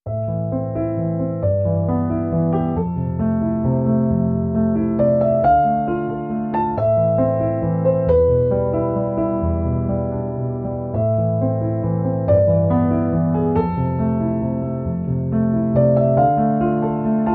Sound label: Piano Waves